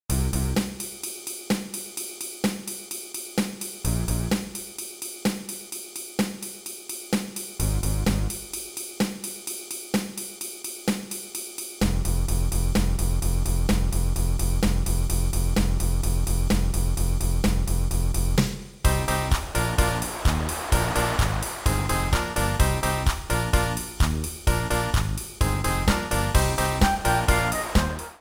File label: Midi